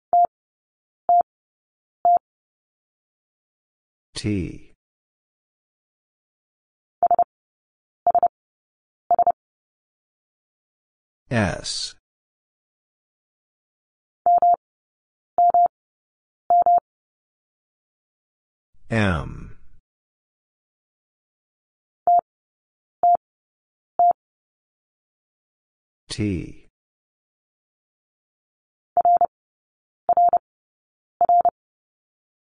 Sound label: Letter m Single Character Repeated 3x 30wpm, morsecode; Lesson 18b